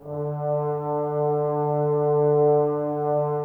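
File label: brass trombones D, Woodwind Brass Ensemble; 90 s Sample CDs; Miroslav Vitous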